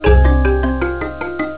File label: Music Box, Cisco Unified IP Phone 7945G